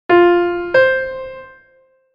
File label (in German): Intervalle sukzessiv